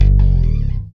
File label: BS CHPSTK, Chapman Stick; Roland L-CD701